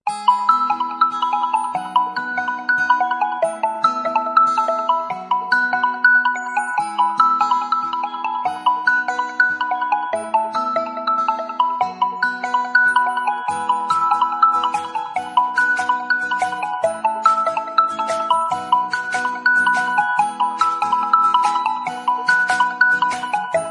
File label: Redmi k30 Ringtone